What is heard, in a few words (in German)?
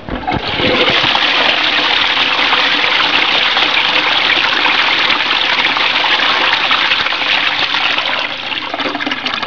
Klo